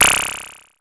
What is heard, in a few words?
TECHNO PERCS; Crypton - DrumBase5000